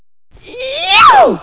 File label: Scream; M-SO Message Board